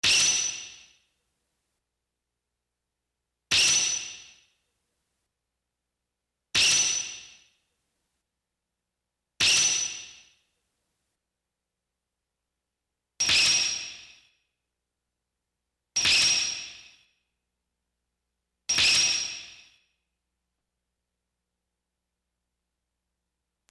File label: Katana